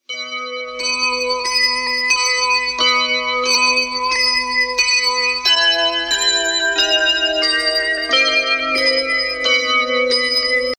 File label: Christmas Bell